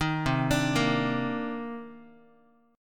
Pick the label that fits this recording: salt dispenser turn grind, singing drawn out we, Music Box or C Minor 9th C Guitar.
C Minor 9th C Guitar